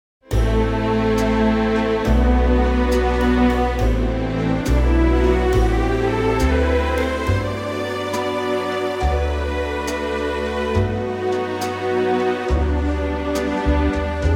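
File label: G no trumpet, male; no solo; key - G - vocal range - D to E (optional G top note)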